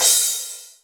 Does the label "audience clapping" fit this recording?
No